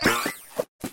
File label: rollover book purple; activity center; backyard; preschool time